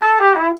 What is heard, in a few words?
Trumpet; pitchglitch samples; Emirdemirel